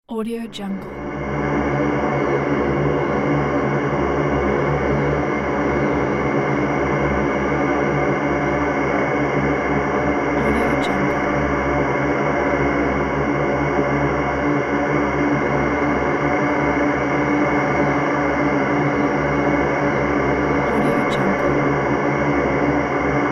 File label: eerie atmospheric drone